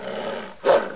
DOG, animal

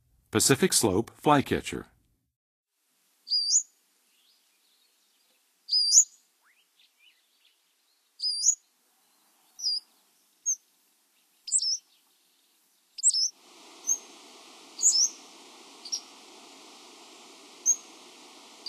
Pacific-slope Flycatcher; North America; Western USA; Western USA Songbirds

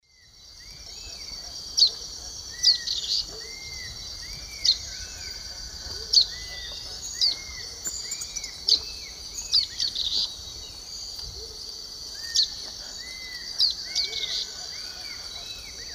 Myiozetetes similis, dawn song; S. America; Eastern Lowlands

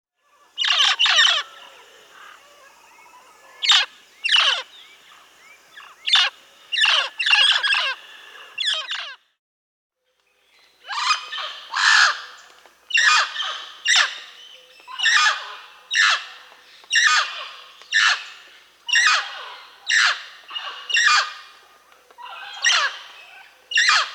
Mealy Parrot (Amazona farinosa), Peru, Bolivia; S. America

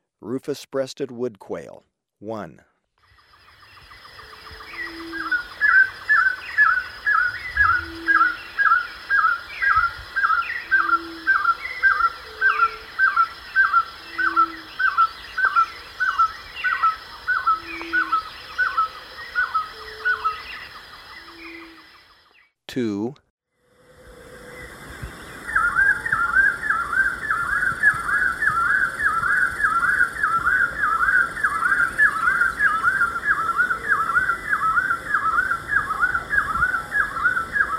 Rufous-Breasted Wood-Quail, Birds of Ecuador; S. America

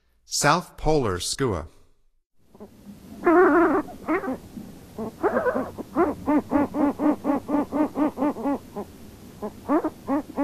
South Polar Skua; North America; Stokes

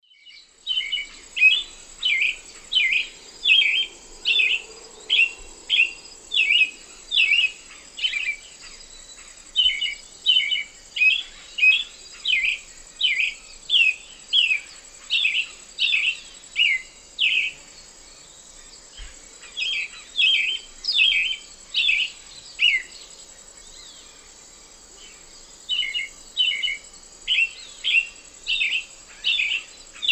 Turdus ignobilis, song; S. America; Eastern Lowlands

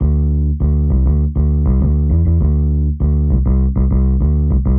X Bass, Rock Bass

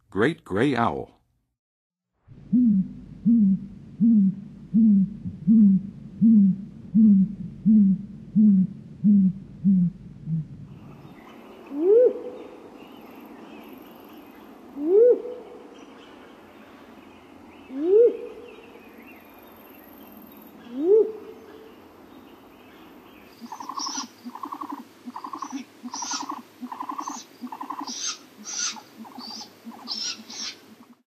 Great Gray Owl; North America; Western USA; Western USA Songbirds

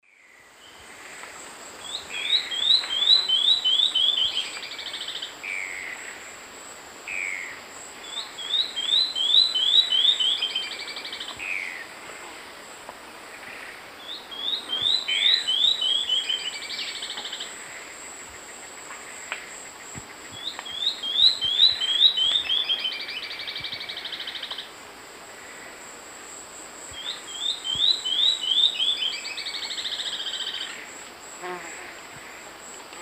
Sclerurus mexicanus, song; S. America; Eastern Lowlands

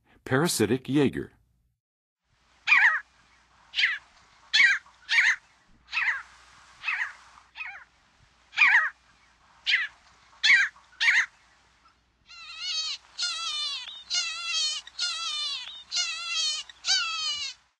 Parasitic Jaeger; North America; Western USA; Western USA Songbirds

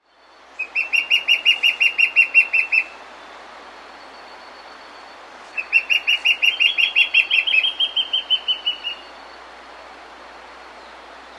White-Backed Fire-Eye; S. America

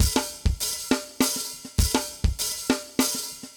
brute, metal

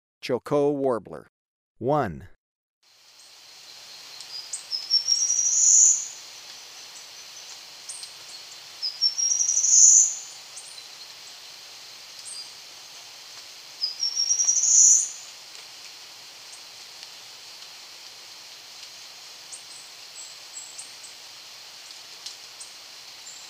Choco Warbler, Birds of Ecuador; S. America

